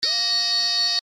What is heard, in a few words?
alarm